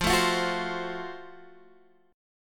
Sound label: Gb Minor Major 7th Flat 5th, chord